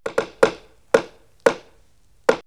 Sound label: Pwr FX; On D Edge; X-Static Goldmine